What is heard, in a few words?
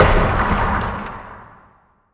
asteroid damage oxide big; Factorio